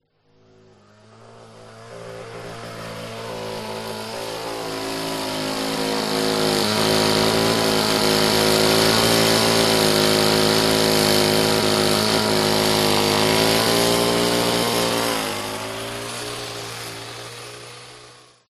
household whipper snipper petrol approach away

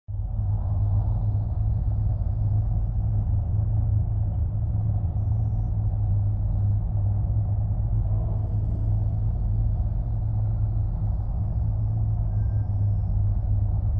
horror deep whispering rumbling room tone; Audio Mangler

